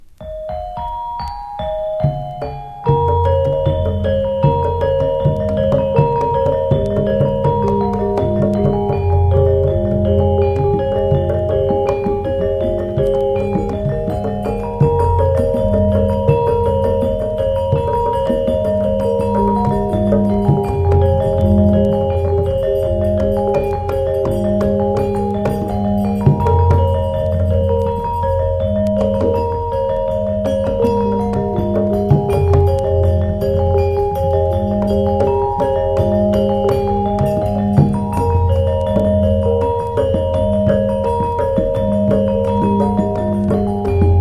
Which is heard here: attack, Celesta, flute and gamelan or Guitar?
flute and gamelan